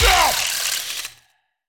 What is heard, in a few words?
yell; HeavenStudioPlus; ringside